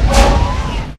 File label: lift; gmod; lfs luna s flight school; laatc atte